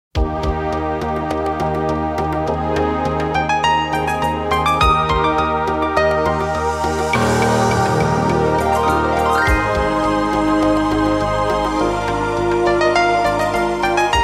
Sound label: Calm Tone